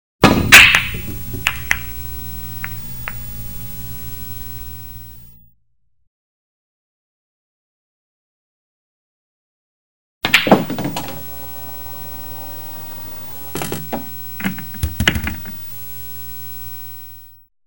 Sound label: ball hit; SOUND EFFECTS1